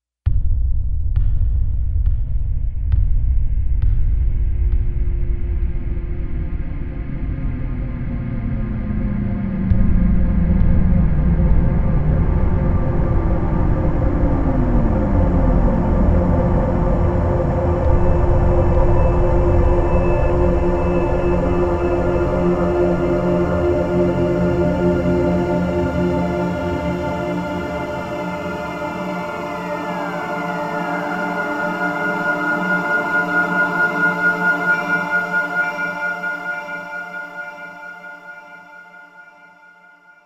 SCI FI HIT; Digital Synthesizer

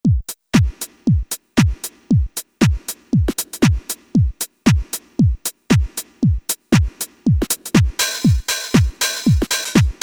DK TEK'90A, Generalmusic Equinox, Drum Kits

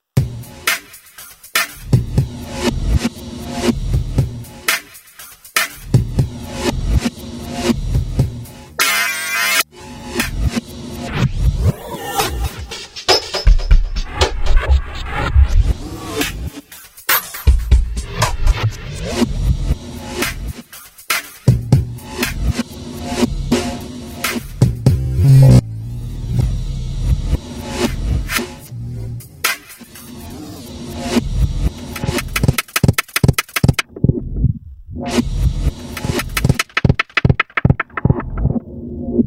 Roland V-Synth; Time Warper; Factory Patches

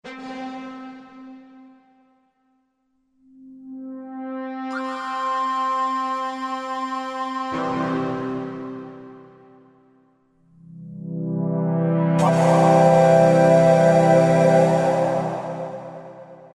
Korg M1 Surprise!!; Factory preset examples

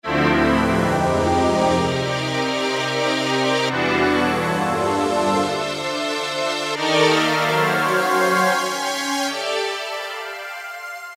Arrangment, Kawai K1m

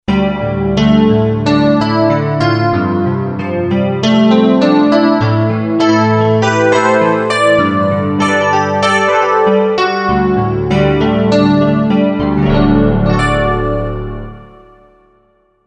Korg MSC-05S Piano, Pianos

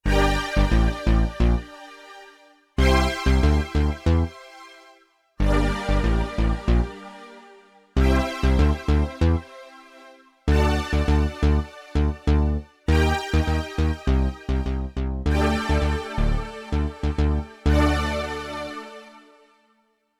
Syn Bass Split, Yamaha TX16W; Combo Performance 1; Syn Bass-MULU Split

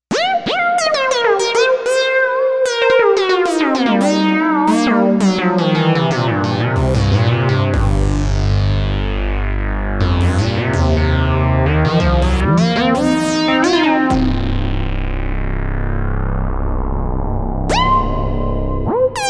Lead FAT LEAD, synth

